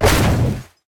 bulk metal hit; Smite; Cabrakan

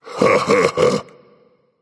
heh heh, voice; Smite; ymir skin14

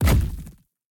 footsteps; smite; atlas

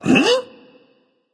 surprised, voice; Smite; ymir skin14

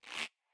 minion bow shoot; smite; npc; roman archer

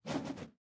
staff spin; Smite; Baron Samedi

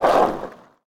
skateboard roll push; shared prop; Smite; god Loki v3

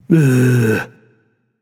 nv tongue; smite; voicepack; hachiman

